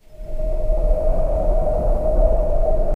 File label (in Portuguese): efeito sonoro de esgoto, underground